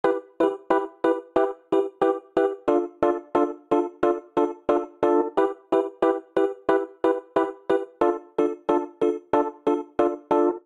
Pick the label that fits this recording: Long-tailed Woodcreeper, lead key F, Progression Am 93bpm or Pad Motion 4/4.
Progression Am 93bpm